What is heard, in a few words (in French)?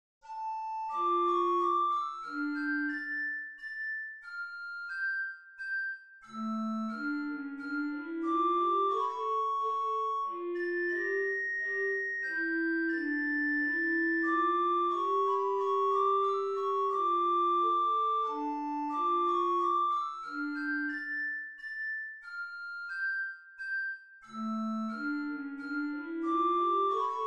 menuet mineur; lully jean baptiste